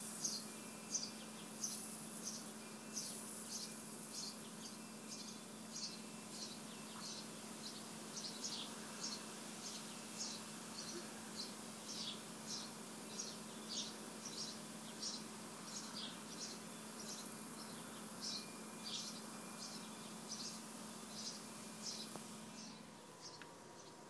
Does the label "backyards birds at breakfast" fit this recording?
Yes